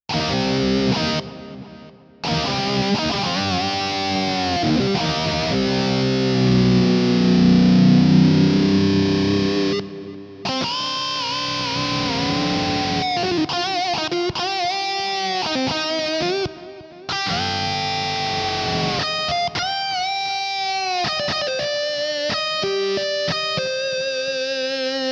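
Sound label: searing guitar; ax-09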